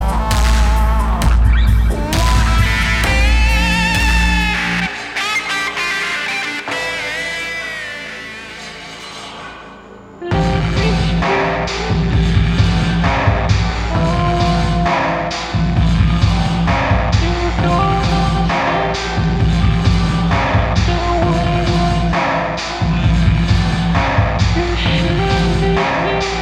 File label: speed metal; techno